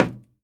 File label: wall building; Golden Crayon Sounds